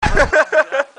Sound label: heheua